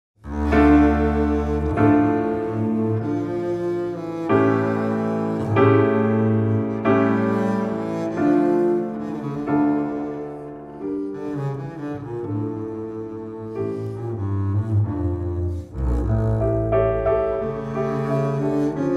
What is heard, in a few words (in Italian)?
Adagio dal trio; Corelli